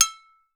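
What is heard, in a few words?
brake drum, metal bells; Voice Crystal - Steve Reid Definitive Percussion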